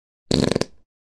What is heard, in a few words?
Baby farting